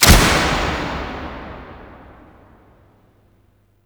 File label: Ocean Light Clunk Large; Ambience; Warframe; Grineer Ocean